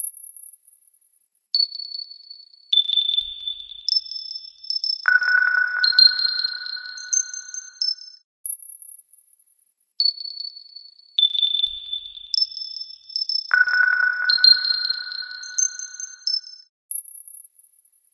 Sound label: Drm loop fast